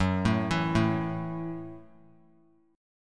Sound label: Gb Minor 7th; arpeggiated; JGuitar